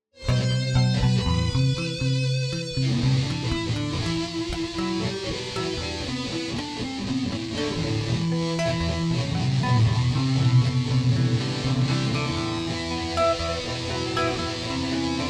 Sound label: instrumental